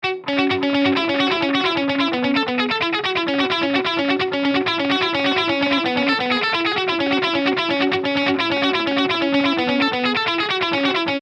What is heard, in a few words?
with deley